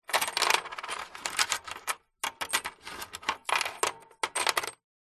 foley money coins handling movements in plastic cash register till drawer; zapsplat